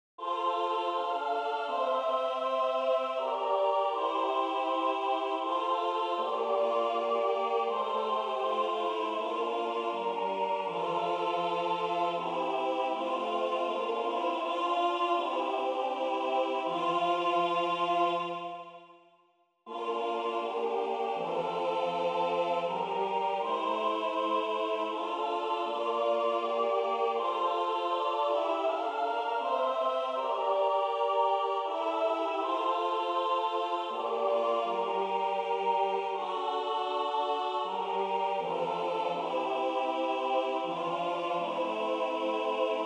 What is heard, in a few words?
Revecy Teil 2 Mezzo Soprano; Revecy venir du printans Teil 2